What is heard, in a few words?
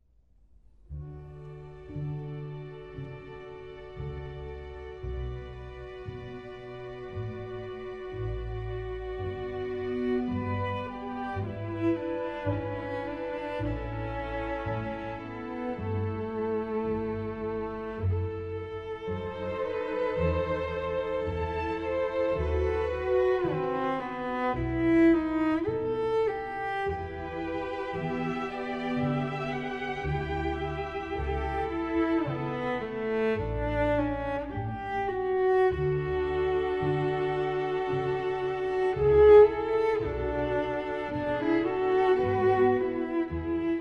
Orchestral Suite No.3 in D major; Bach; Air on a G string